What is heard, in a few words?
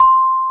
xylo C5